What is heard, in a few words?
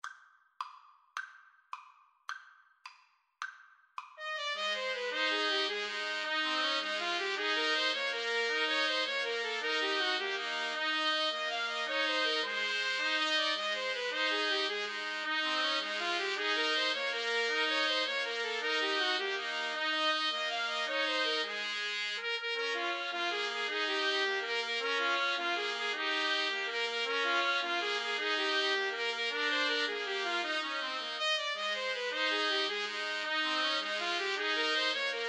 3TPT, Trumpet Trio